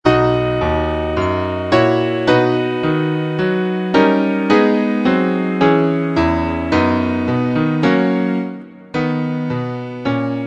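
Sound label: accompaniments, Trumpet in B; Vocalise #2; concone; Rudimentary Transposition Studies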